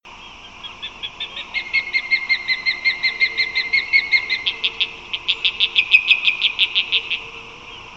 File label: Ospreys Alarm Calls With Escalation; SF Bay